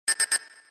almost perfect; star cores

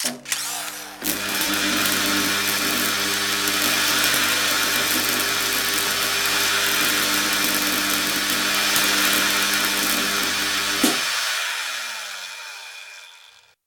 build, factory; CosmicRage

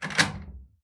door lock; CosmicRage; doors

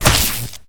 flesh; combat; weapons; default shootable

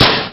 gas tank, factory; CosmicRage

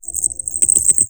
bracelet chime; CosmicRage

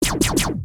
enemy lasers, ships combat

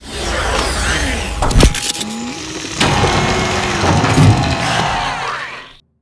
extend scoop, ships; CosmicRage; CosmicRageSounds

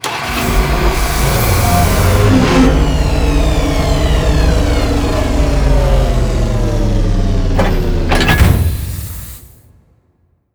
engine stop, vehicle; CosmicRage

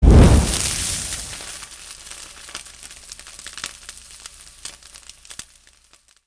vacus fry, enemy; combat

